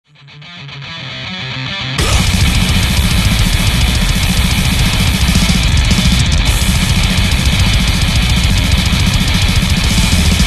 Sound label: blastbeats